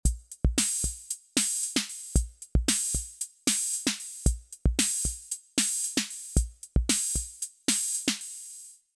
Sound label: drum machine dry